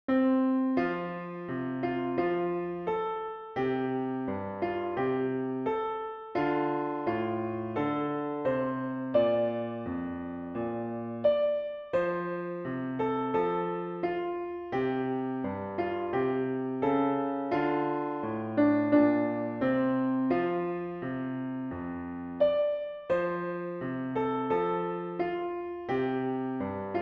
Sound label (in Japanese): ピアノ